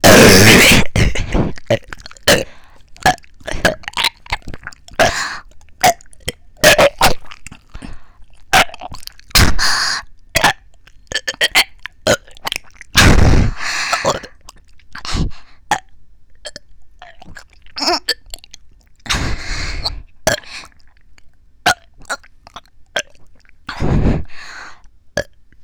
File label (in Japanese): ゲップ祭りASMR ゲップのみ; 実演; ハイレゾASMR